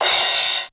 crash, Casio RZ1; drum machines

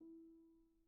GPiano sus E3, Grand Piano, Kawai; Chordophones; Sustains

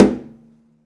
pizz noise body slap high, double bass; dsmolken; noises

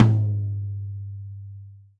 Tom Med; hydrogen drum samples

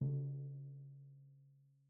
Timpani hit; Timpani 2; Struck Membranophones

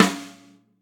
Snare Rimshot Hard

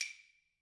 Claves Hit; Idiophones; Struck Idiophones

